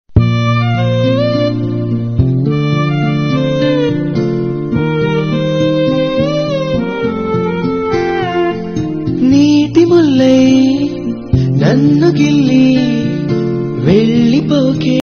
background music; varsham movie

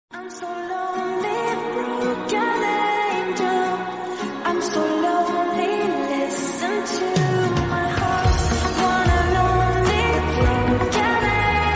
instrumental ringtone

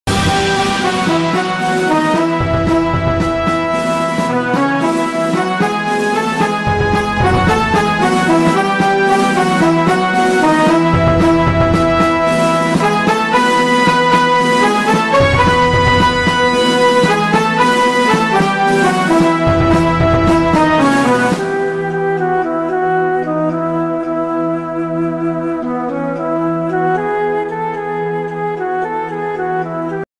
sare jaha se achha hindustan hamara ringtone

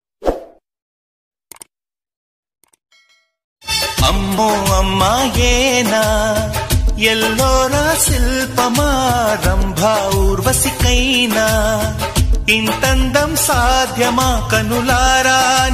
romantic ringtone

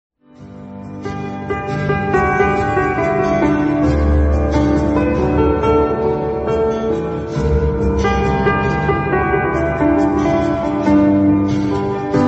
song instrumental bgm ringtone

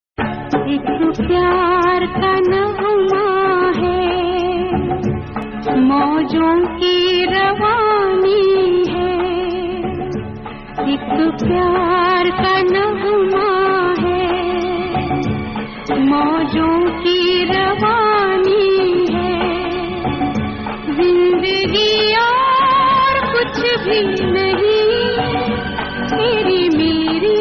old song ringtone

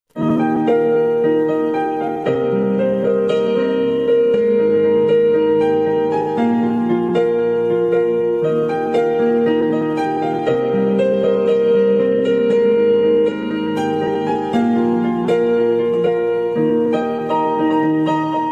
tamil love bgm ringtone

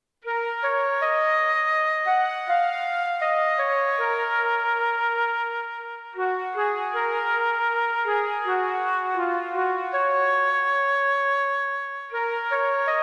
bgm background music perfect piano; ms dhoni; easy tutorial

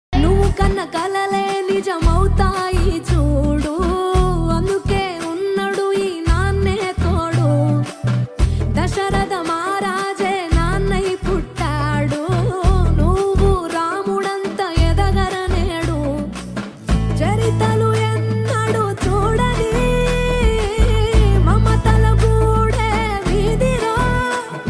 telugu love song

